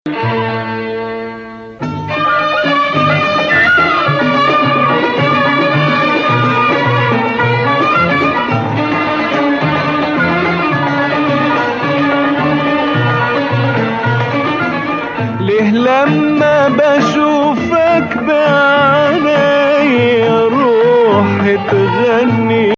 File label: Nawa Hijaz; the note under the Hijaz tonic distinguishes it from Hijazkar; Maqam Nawa Athar; Hijaz 5